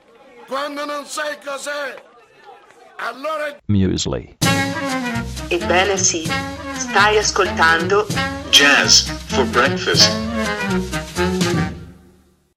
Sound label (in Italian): stacchetto jazz